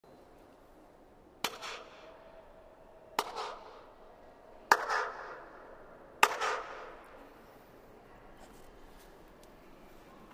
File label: hand claps in snake; Trevor Cox; SoundBook